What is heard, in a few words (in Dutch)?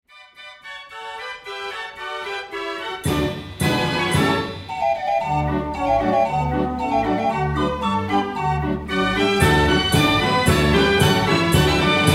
draaiorgel; de hagenaar